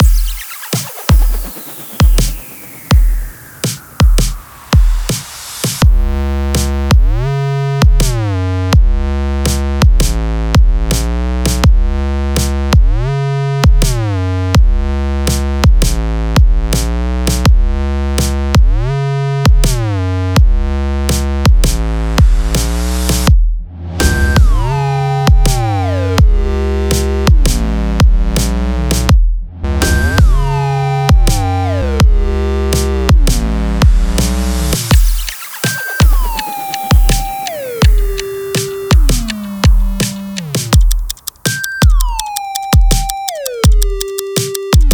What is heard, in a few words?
(Instrumental)